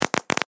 Electric Spark Burst, Electrical short circuit sparks arcing; Electricity sounds